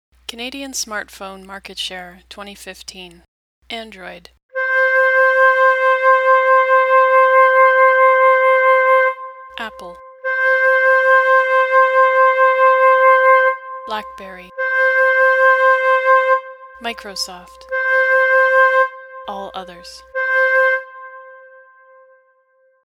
single sound integrated legend

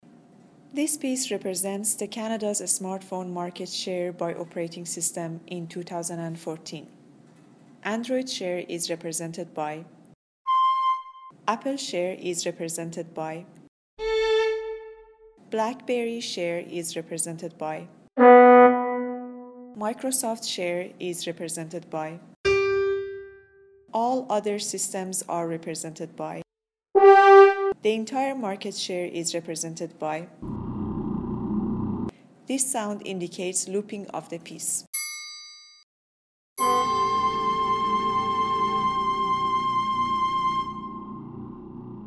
Sonification Pie Chart Legend

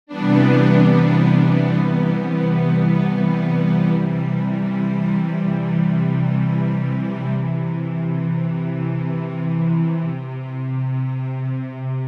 sonification stacked strings Left

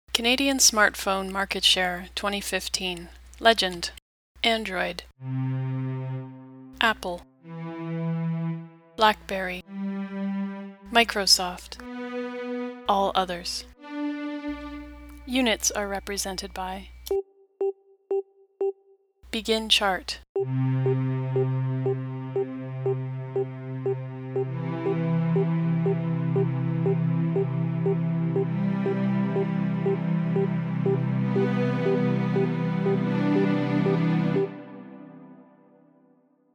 sonification stack R units pitch strings

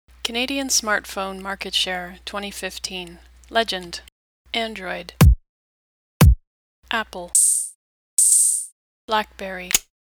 sonification percussive legend first

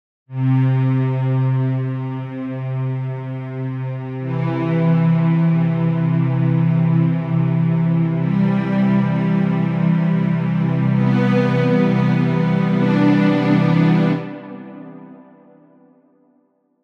sonification stacked strings Right

